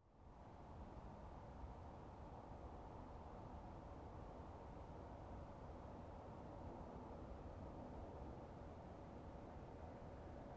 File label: Car approaching and parking